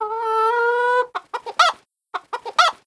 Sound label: chicken cluck; Taunt Skywrath Mage